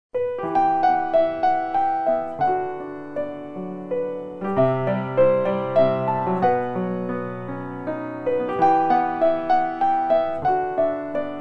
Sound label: the music box; euphonia